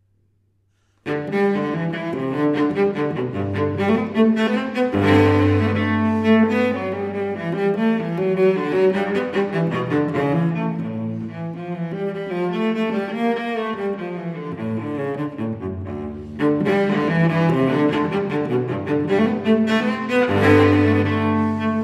Suite 1 gigue, violoncello solo; BWV 1007; Bach; Sei suites per violoncello solo

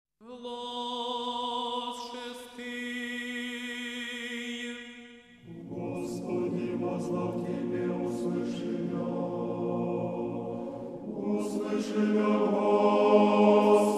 Choir; Inni per la vigilia di Natale; Kiev Seminary Choir; Lord I cry unto thee

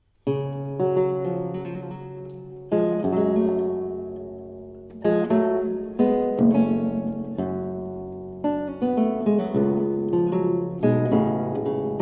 Prelude Sol mineur, Luth Baroque et le Theorbe; Robert de Visee

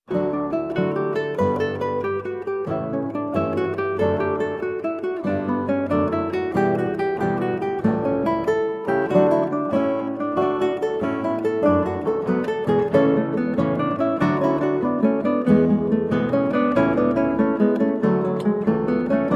Allegro Assai, Guitar; from Trio Sonata in D Major TWV 42 D4; Telemann; Georg Philip Telemann

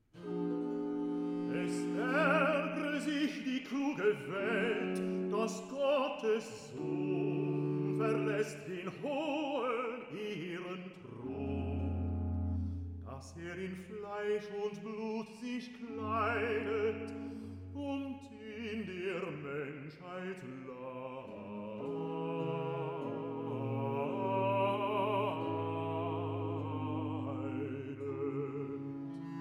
Recitative; Tritt auf die Glaubensbahn BWV 152; Es argre sich die kluge Welt; Bach

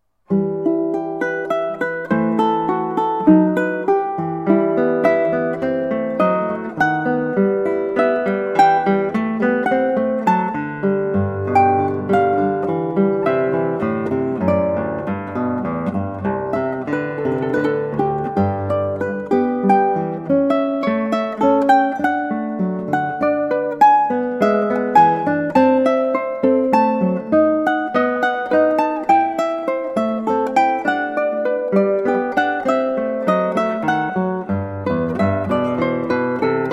Prelude, Guitar and Ukulele; Prelude 7 (short preludes BWV 932); JS Bach; Johann Sebastian Bach

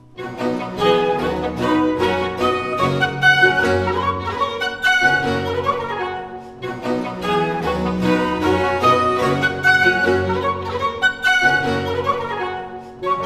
Rigaudon; Suite from Les Fetes D'Hebe; Rameau

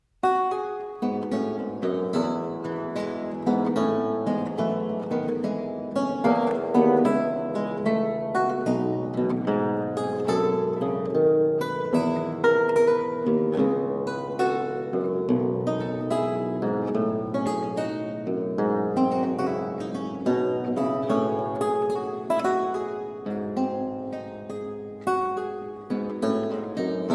Gigue Qui Imite Kuku in F Major, Lute; Anton Logy

